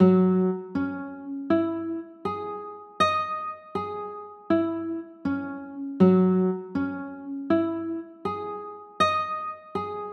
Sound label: g flat 13; chords